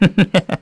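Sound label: Laugh, Korean; Zafir; kings raid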